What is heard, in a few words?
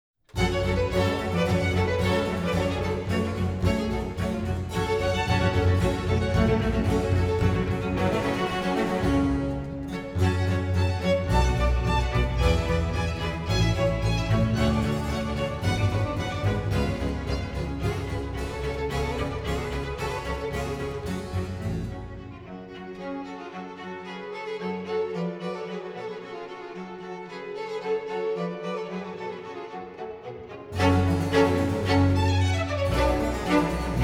Concerto for Strings in G Minor Adagio; L Archicembalo